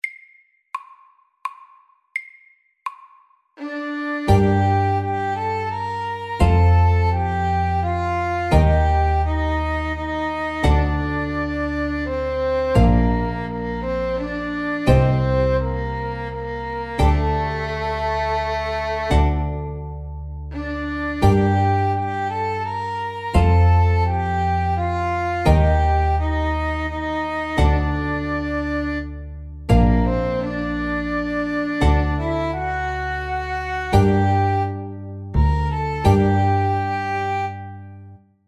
Folketone MIDDELS

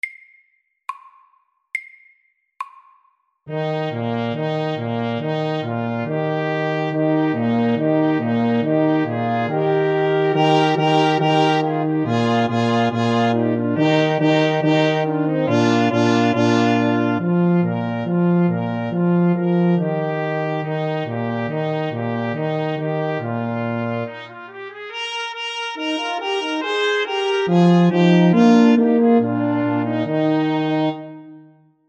Fanfare SAKTE

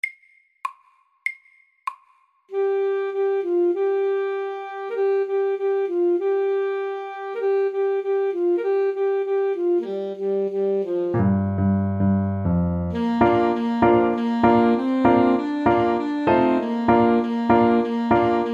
Pentatonisk melodi sakte

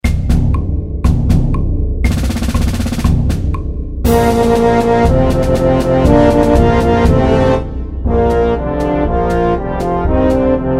Fanfare og marsj FORT

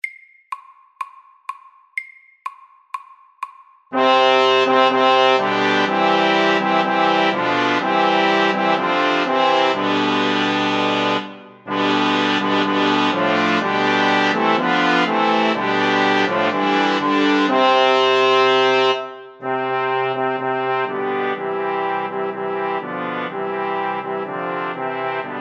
Fanfare for tre FAST 124

